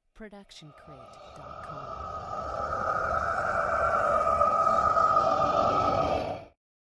horror rising ghosts

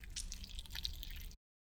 stylized squish; soundscrate

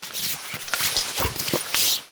fabric wipes

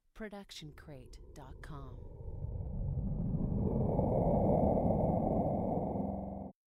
Machine Charge Up; SoundsCrate

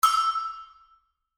cowbell

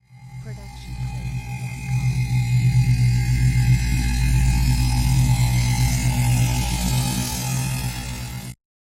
Buzz Charge Up; SoundsCrate

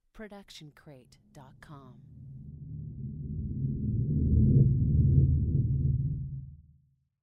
Dark Magic Movement; SoundsCrate

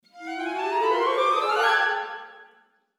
rising piccolo

